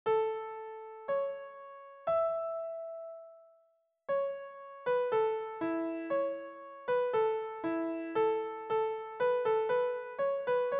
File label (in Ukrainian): Ля мажор; Диктанти по рівнях; 6 рівень